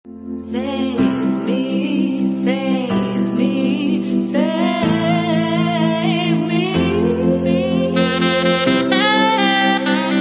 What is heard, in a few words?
sax